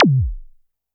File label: korg trident